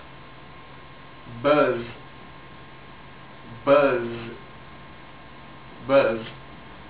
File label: buzz